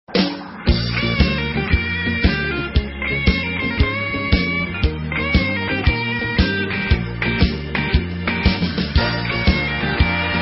Shuffle Boogie Soul Honky Tonk

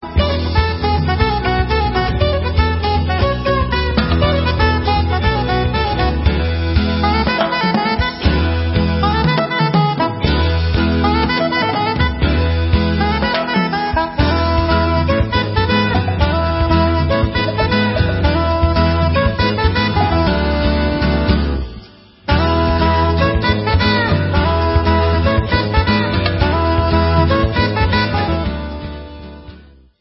Instrumental

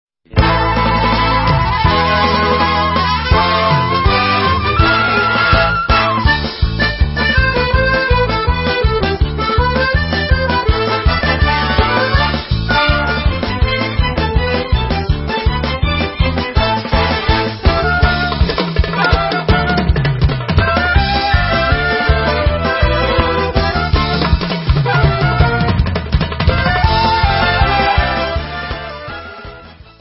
Instrumental

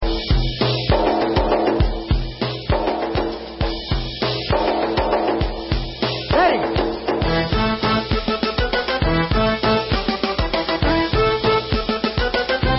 Instrumental

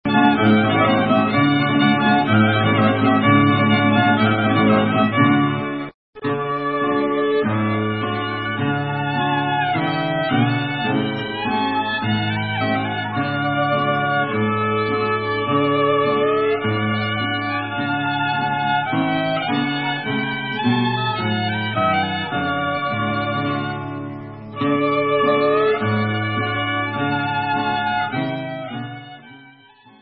Greek Corfu; Corfu Medley

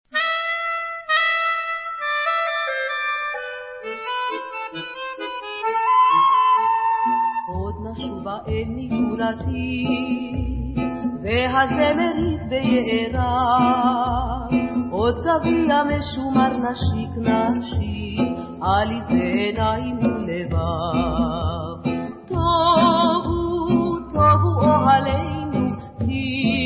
Vocal

